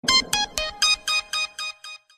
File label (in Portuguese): efeito radio; radio globo